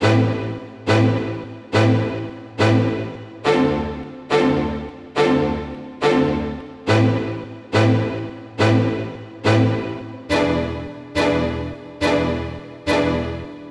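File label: Orchestral String Stabs, Cinematic Trailer Loops; 140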